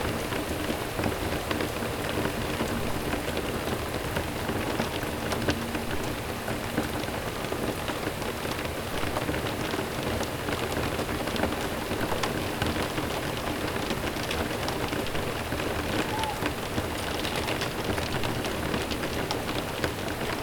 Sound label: Loopable Rain Sounds nature Sounds Easy Droplets